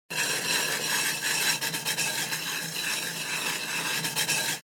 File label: Wire brush on metal scrubbing pan kitchen